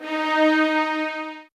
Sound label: D, soft violins; Q Up Arts; Denny Jaeger Master Violin Library